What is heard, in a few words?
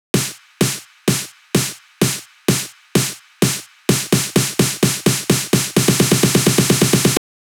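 Drum Loop snare, 128BPM; VEC5 Drumloops; VEC5 Multi Loops 128BPM; Loop Elements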